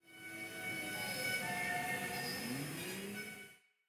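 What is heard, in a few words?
ambience pool; pork knox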